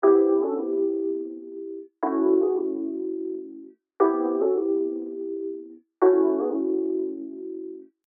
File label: Key Dry, Here I have soloed the key section to show it in its dry state.; 3d mixing; The key output is bussed to a dedicated delay which is then panned opposite and mixed back in with the original.